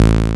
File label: TB BASS